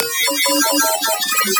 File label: Bass Layer